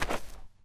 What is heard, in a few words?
sand footsteps; zps; Foley